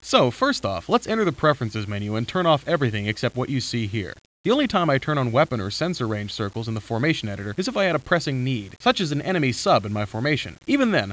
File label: HARP